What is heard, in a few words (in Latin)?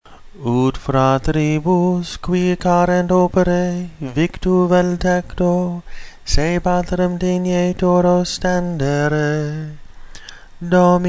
Oratio fidelium Tonus C, chant